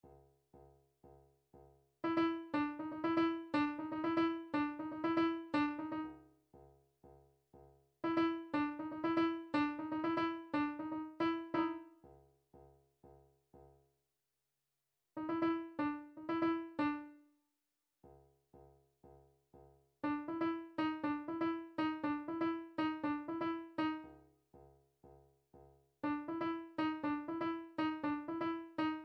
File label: drum trax cong